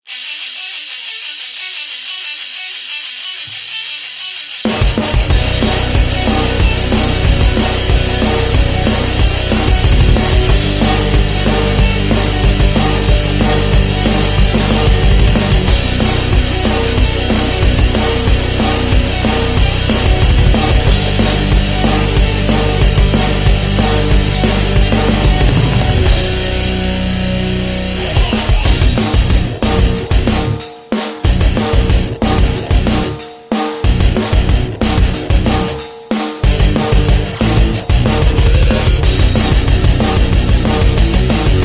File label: Rock Metal thingy instrumental